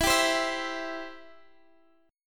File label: E Minor Sharp 5th, Guitar, DADGAD; strummed; x,x,14,12,15,14